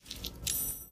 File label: dice; Minecraft; Votive Content